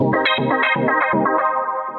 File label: House Arp, Synth Rhythmic; Ableton; Factory Packs; Synth Essentials